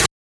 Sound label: snare; goodtakimu drumkit; fl studio; drumkits